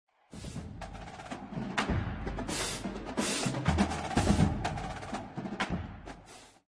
drumline cadence; uofm

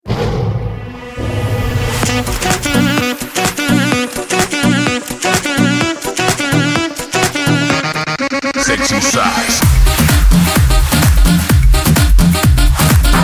cool; dance

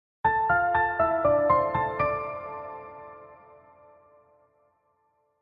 sweet piano tone; ringtones

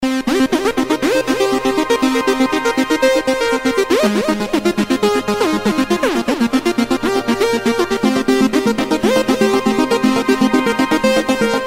Echo Synth

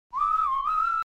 Whistle

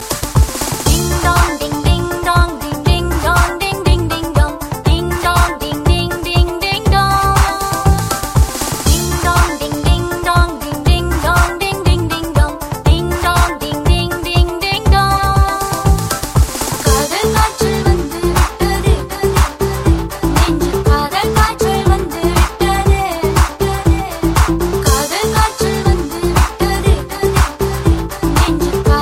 malaysia cny song

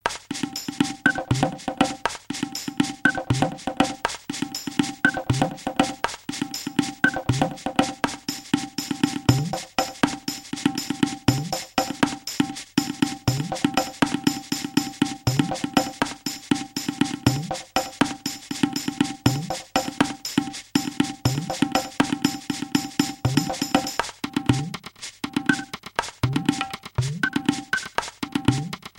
Prehistoric Rhythm

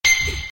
lure

